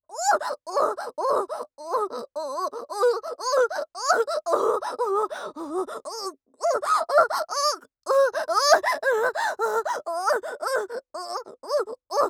おっ！♥おっ！♥おっ！♥おっ！♥

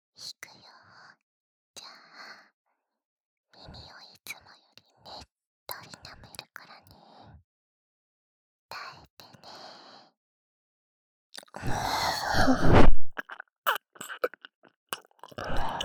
濃厚耳舐め×オナニー誘導ボイス; オナ禁7日目の夜2; オナニー我慢ゲーム

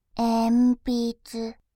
鉛筆, ASMR, バイノーラル 左

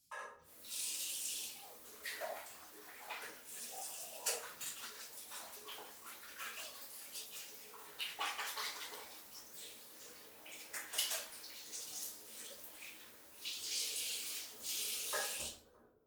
手を洗う

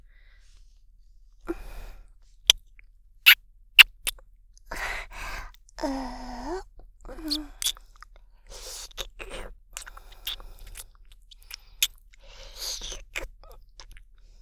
フェラ; ありす; リスみみの地下アイドル＋コンビニバイトAさん

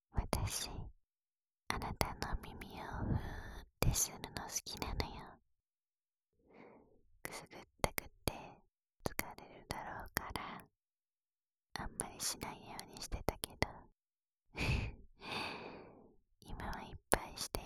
耳吹き, ASMR

